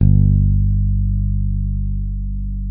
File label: SAD4 SUSTAIN, Bass; Sampleheads; Will Lee Bass Library